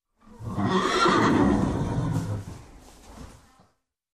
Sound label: Gelding Horse Neigh